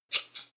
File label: scissors cut paper